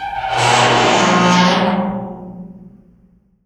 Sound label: METAL TEAR; PERCMETALIC